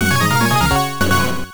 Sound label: Bonus Up; time cruise; turbografx 16